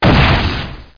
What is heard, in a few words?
Flying Fist Impact; HereticII-Demo; Weapons